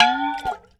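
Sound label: WASHEE BEL, percussion metallic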